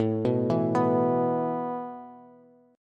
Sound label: A Suspended 2nd A Bass; Asus2 arpeggiated